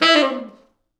T Sax falls, Horns Sax

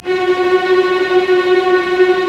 STR SEC; Roland - LA Composer vol. 1

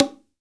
BONGO, STEREO BONGO, Cuba; AKAI S6000 CD-ROM - Volume 5

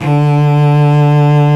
SOLO CELLO

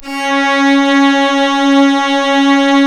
C#, strings; Big Fish Audio

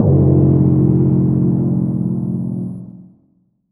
Percussive Stab

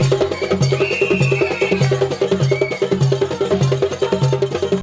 KANKURAN DANCE; Best Service; World Colours

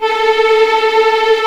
A4 TREM, VLNS TREMOLO, String; East West; The Ultimate String Collection

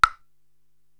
Vocal Woodblock

